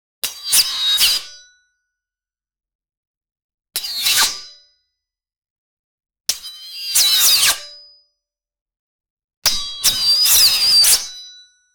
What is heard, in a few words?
electric sword slash